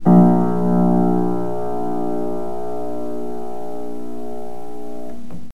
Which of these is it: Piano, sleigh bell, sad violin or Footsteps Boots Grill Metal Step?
Piano